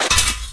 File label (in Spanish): paso arena; paso arena arm; severance